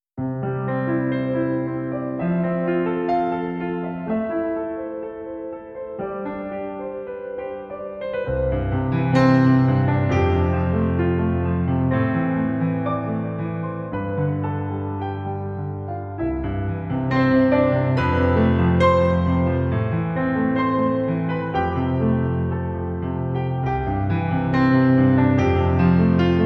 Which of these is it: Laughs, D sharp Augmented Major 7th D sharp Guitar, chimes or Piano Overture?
Piano Overture